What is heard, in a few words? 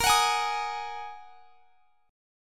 Bb 7th Suspended 4th Sharp 5th Bb down; strummed; Bb7sus4#5